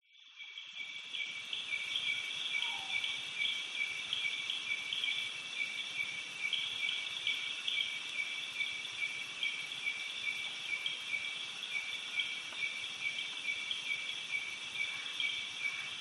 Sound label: insects cicada birds flowing water creek night doi suthep national park thailand